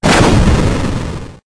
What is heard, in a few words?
thunder